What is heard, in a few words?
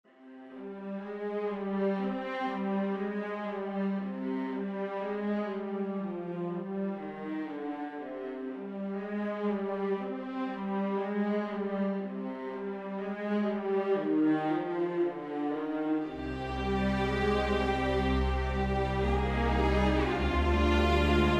Just noodling with Strings